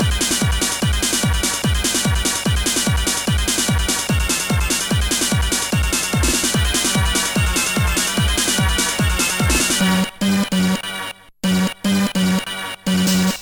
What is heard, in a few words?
mod tekknisch